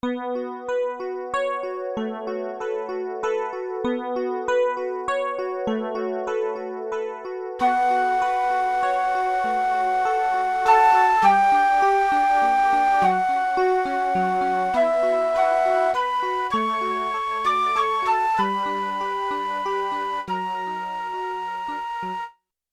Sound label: EP-Flute Split, Yamaha TX16W; Combo Performance 1